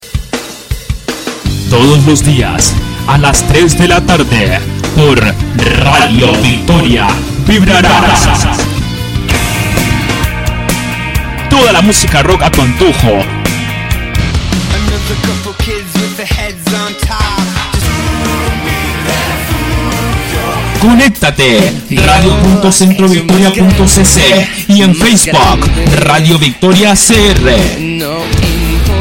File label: Jingle